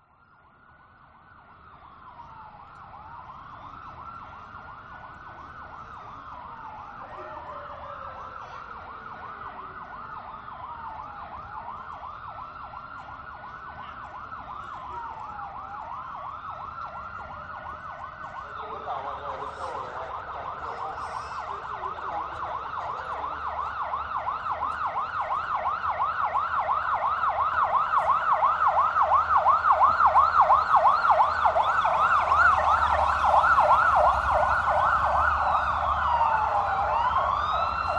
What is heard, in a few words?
police sirens convoy escort police cars and motorcycles president motorcade passing; vietnam